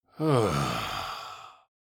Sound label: Senior male relief moan